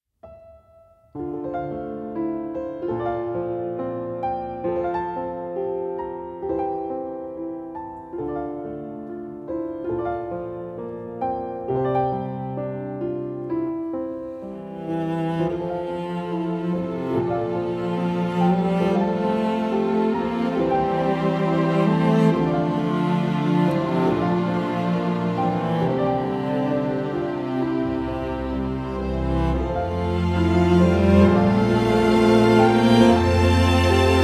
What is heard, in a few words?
Broken Shards Orchestral Version